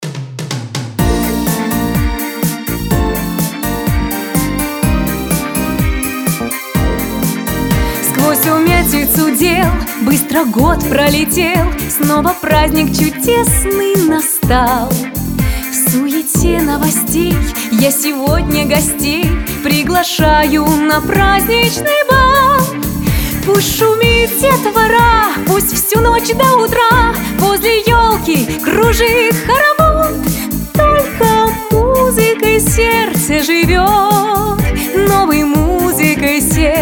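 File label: pop backing track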